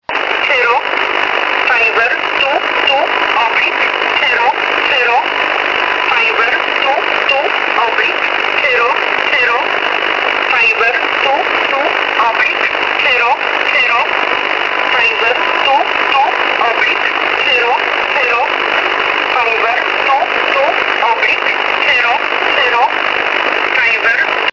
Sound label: number station oblique